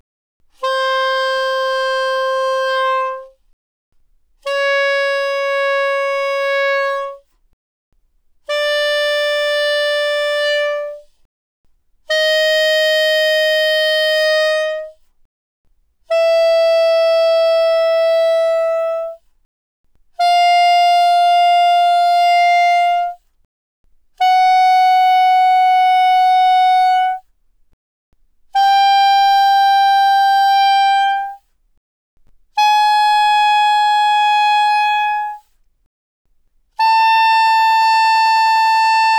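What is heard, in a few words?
Soprano Sax No Vibrato ff C5 B5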